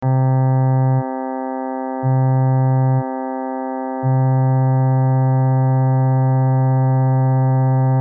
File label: cello